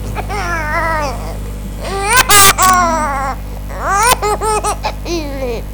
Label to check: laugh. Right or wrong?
Right